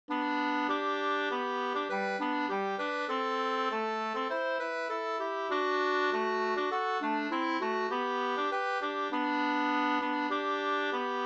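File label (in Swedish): brudmarsch 2 stämmor; Anselms brudmarsch; Eric Hammarstrom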